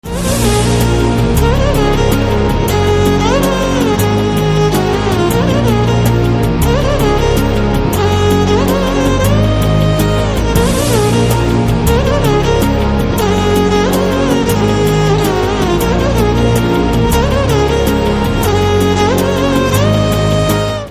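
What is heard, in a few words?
background music Telugu Ringtone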